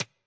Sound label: machine, Machine Electro Hits; Hip Hop; STYLE KITS (Hip Hop, Etc)